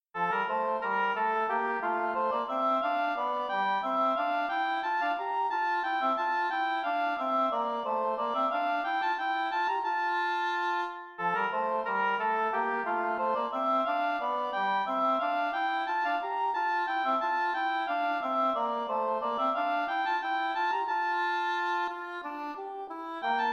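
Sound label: air en rondeau oboe; mattheson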